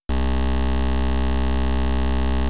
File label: oak pulse